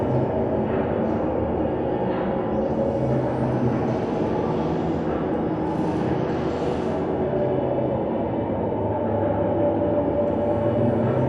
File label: Robot Arm Track Med Dist; Boomtown; Titanfall 2